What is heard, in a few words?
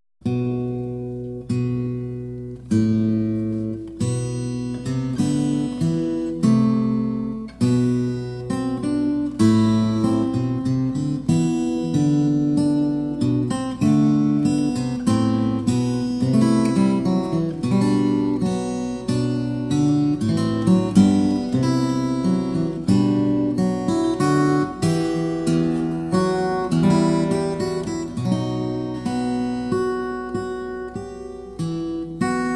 Recercar 5 steel string guitar; Paul Berget; The Siena Manuscript